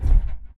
footstep jog; Titanfall 2

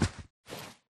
spectre blind fire grunt; Titanfall 2

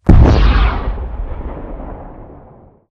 redeye weapon fire; Titanfall 2

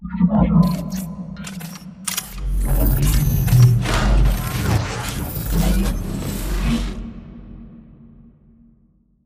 pipe idle reverb

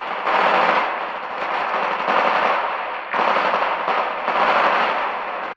under fire east tower; Titanfall 2

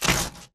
bt footstep jog parts; Titanfall 2

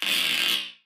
bullet energy titan shield spang cluster

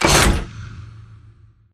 sliding door ext verb open start; Titanfall 2

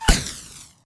imp bullet lightballistic impactsweetener

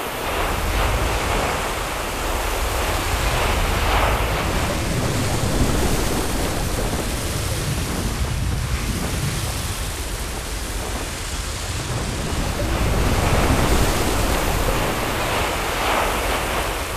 smoke container; Titanfall 2

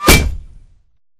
Imp Bullet Light Ballistic Solid Metal; Titanfall 2

